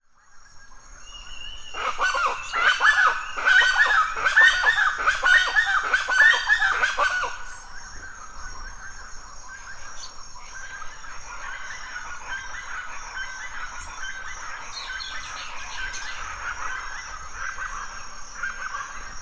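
animals birds chachalacas loud call dawn forest mexico; felix blume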